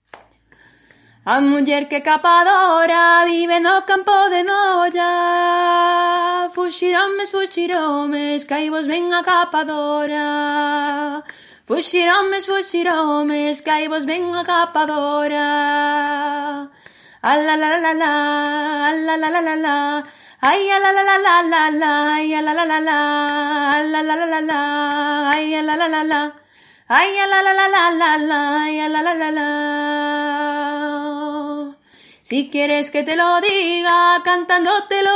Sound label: Muinieira; Ateliers chant